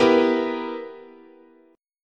B Major 7th Suspended 4th Sharp 5th, guitar chord

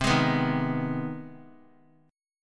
C Minor Major 7th Sharp 5th chord; CmM7#5 strummed; JGuitar

